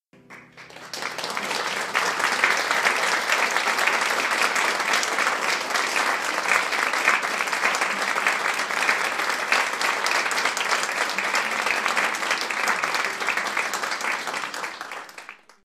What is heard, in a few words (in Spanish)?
aplausos; Tres En Raya